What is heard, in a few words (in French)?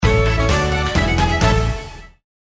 VIRGULE DANSE BRETONNE